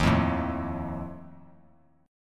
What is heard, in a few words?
E flat Suspended 2nd Flat 5th, Bass Standard; strummed; chord; x,6,3,2; JGuitar